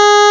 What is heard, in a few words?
MOOG PLS; INIS - Vintage